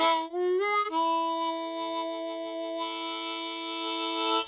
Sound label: harp; Verdigris Music